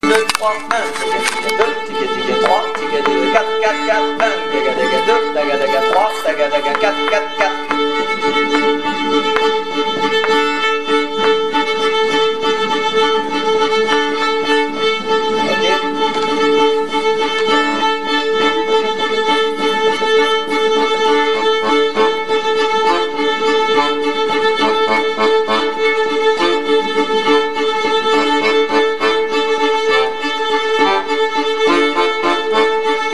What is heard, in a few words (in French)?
intro rythmique violons